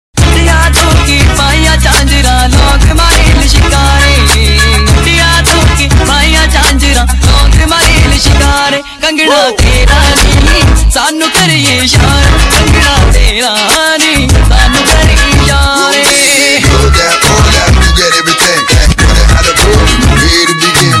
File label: Punjabi Pop